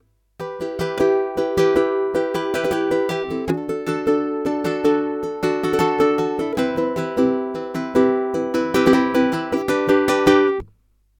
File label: Spanish, uke